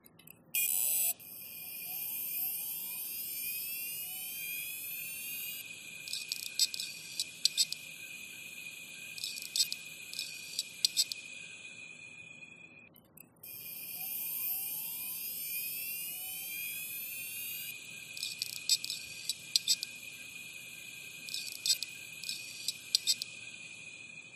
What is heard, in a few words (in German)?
Seagate ST3000DM001 klickendes Geräusch